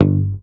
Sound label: Hamer mute G1, bass; Sampleheads; Will Lee Bass Library; HAMER12